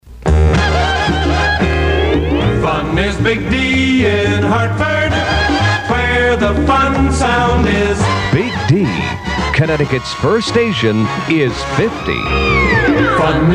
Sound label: PAMS jingles